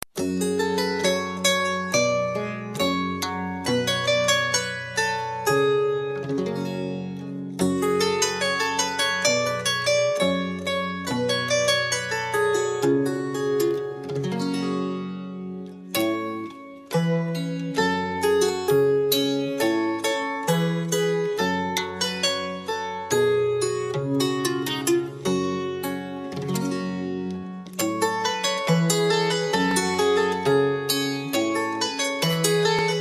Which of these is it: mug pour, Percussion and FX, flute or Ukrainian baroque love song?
Ukrainian baroque love song